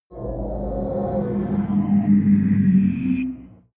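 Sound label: haunted voice, drones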